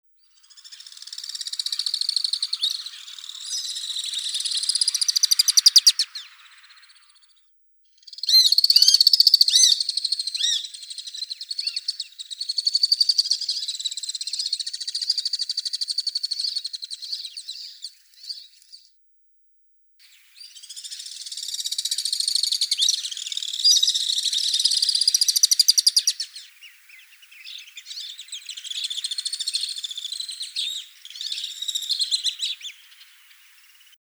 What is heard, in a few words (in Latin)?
Apus melba